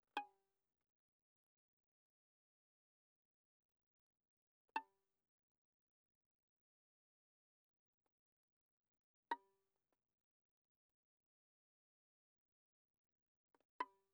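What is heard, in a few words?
Violin pizzicato pianissimo sul D Ab5 B5; Strings; violin2012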